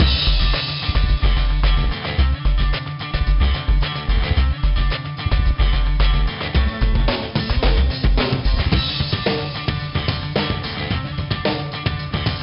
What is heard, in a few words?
Menu Rock; hot wheels track attack 2010 nds gamerip